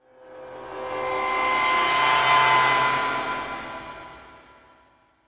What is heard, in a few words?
amb sound; borg